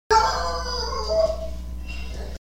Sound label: oliver woof, bark